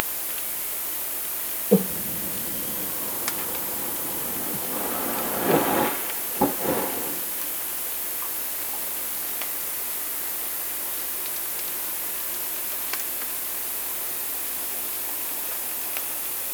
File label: Orca sound Night Passby